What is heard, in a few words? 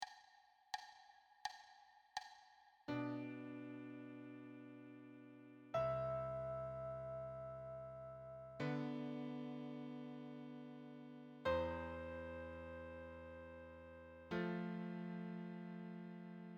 wholenotes duet